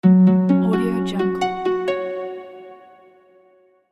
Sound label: magical harp